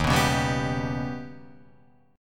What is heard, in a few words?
Eb Augmented 7th Eb down; Eb+7 strummed; JGuitar